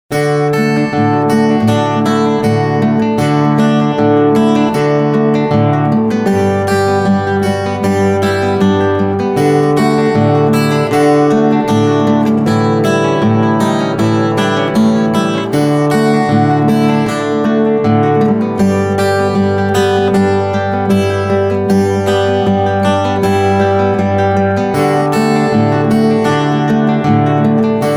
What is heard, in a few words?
country life, gitarre; blues; ragtime